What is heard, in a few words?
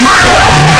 jumpscare sound; fnaf 3 teaser springtrap